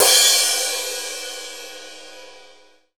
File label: Cymbals